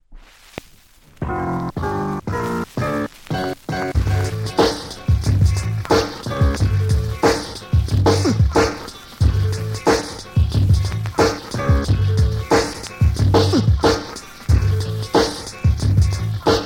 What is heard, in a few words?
Instrumental